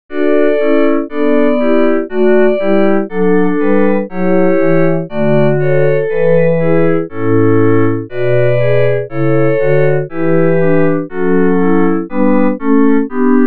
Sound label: Bach Choral Pythag Tuning; BWV80